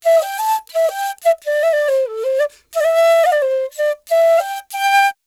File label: ETHNO EXTRA 90; ethno2